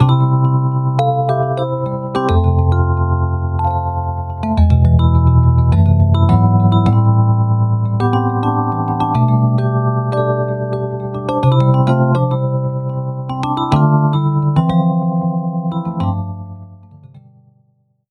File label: electric dyno hi-res lik; electricdyno mkv 2.0